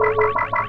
power up glow loop; Nickelodeon Basketball Stars 3; ThrillGames

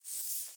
cards split; Ben 10 - Spot The Difference

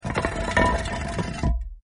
gate; Legends of the Hidden Temple - Unlock the Past

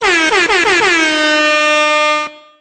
airhorn; Nickelodeon - Destruction Truck Derby

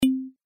button roll; Cartoon Network - Toon Cup 2020

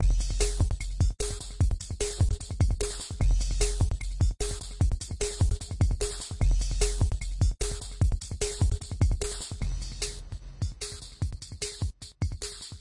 music loop; The Powerpuff Girls - Trail Blazer; NextlevelGames

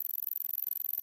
sfx score counter; Ben 10 - Omnicode

